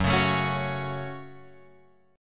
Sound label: Gb Minor Gb Guitar Open F; strummed; JGuitar